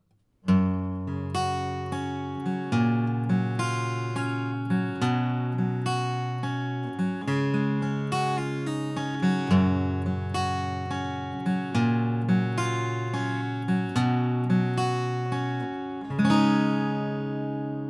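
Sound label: guitar pick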